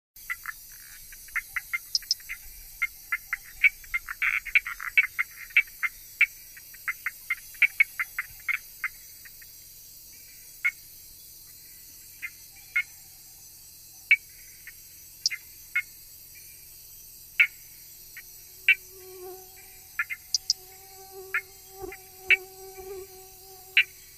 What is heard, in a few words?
Raw field recording (Frogs and insects); James Webb